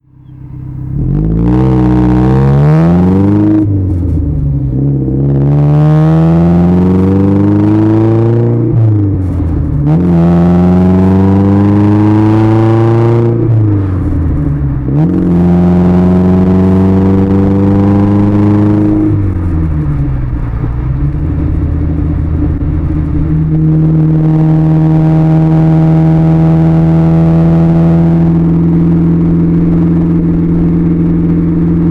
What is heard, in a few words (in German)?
alfa romeo spider weber doppelvergaser landstrasse